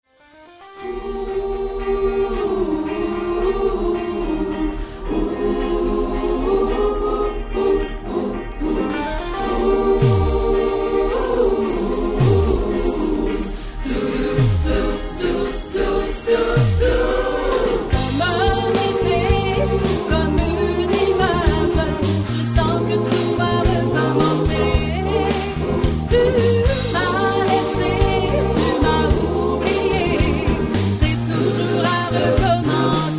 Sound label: medley disco